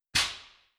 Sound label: Steam Machine Negative; adams venture origins